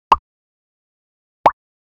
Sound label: pop click sound